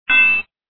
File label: anvil